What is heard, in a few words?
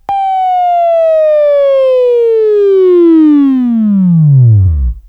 Buzz